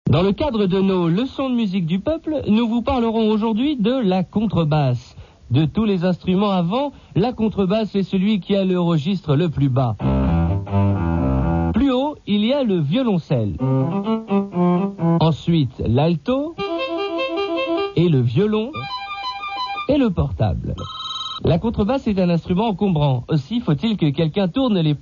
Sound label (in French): la contrebasse